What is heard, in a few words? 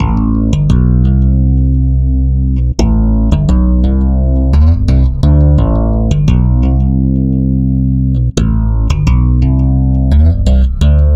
Stick Loop, Stick Bass